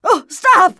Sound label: hurt; True Crime Streets Of LA; XBOX